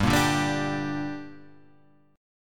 A sharp 6th, chord

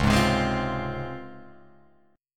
C sharp Major 7th C sharp Guitar Open F; C#M7; strummed

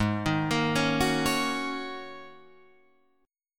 Ab 9th Suspended 4th Ab down Guitar Drop D

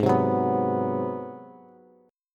G sharp 7th Sharp 9th, Bass; G#7#9; strummed; JGuitar

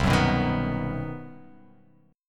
C Minor Major 7th Flat 5th, chord; strummed; x,3,4,4,3,6,x,x; JGuitar

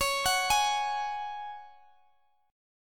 Db Suspended 4th, Guitar, DADGAD; arpeggiated; Dbsus4; x,x,x,18,21,18

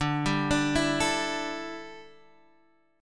D Suspended 2nd Suspended 4th; Dsus2sus4 Chord {X 5 5 7 5 5} arpeggiated

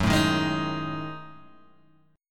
F sharp Minor 6th Add 9th, Guitar, Open G

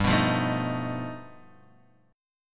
G Augmented 9th, Guitar, Half Step Down

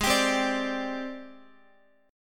Ab Major Sharp 11th Ab down Guitar; AbM#11 strummed

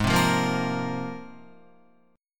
Ab 6th Add 9th Ab, Guitar; Ab6add9 strummed

